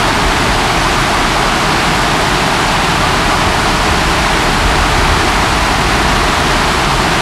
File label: Waterfall Medium Dist Loop